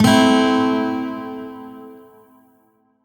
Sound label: Shawzin Maj Chord I; Music; Warframe; Lotus Shawzin